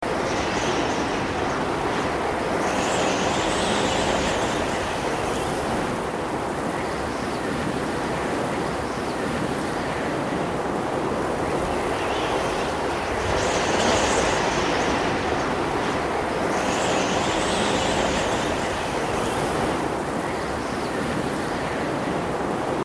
Ambient Storm - Heavy Loop

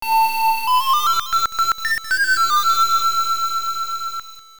Ocarina; Neutopia; TurboGrafx 16

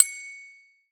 deskbell; Scrat

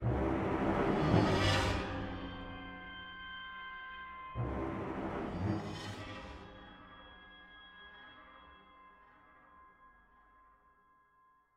Craftsman Music Cage

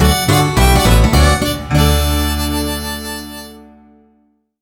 LEVEL END

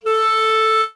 Clarinet tone; game rip; lego my style preschool

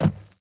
footstep land; Scrat

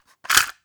Cassette Rattle

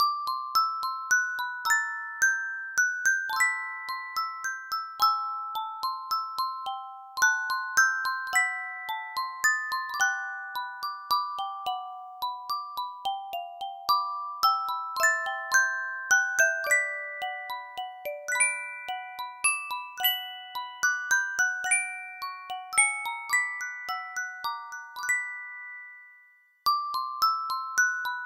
Music Box; Photo Studio

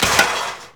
dish dropoff; SpongeBob Diner Dash

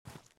run grass

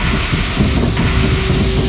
Gears Steam; Nicktoons Nitro; arcade

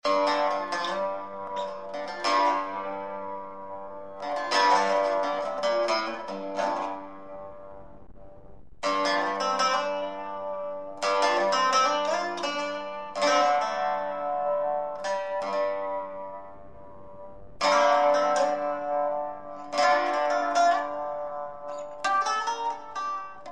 Felyne Guitar

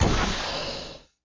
elevator close

